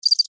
Cricket Chirp, Cricket, Chirp, Single, Insect, Bug